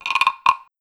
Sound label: Perc Wood Frog